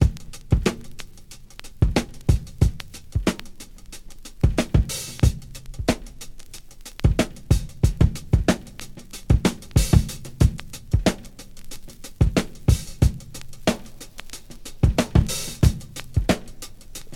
92 bpm breakbeat sample c sharp key, Free drum loop sample - kick tuned to the C# note.